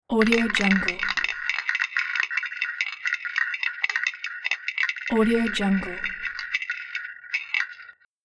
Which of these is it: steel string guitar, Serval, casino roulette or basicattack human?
casino roulette